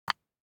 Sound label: DICE on Wooden Tray, Grab, Standard, 1 One Die; Samples; ArticulatedSounds; DICE Sfx Party (Extended)